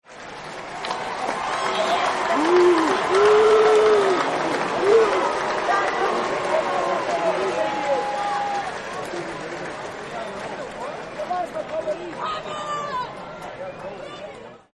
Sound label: Crowd Unhappy Boos; Crowds; Backgrounds